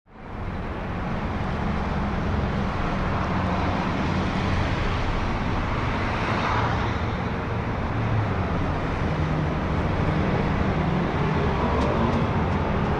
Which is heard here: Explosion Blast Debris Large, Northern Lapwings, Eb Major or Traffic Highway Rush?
Traffic Highway Rush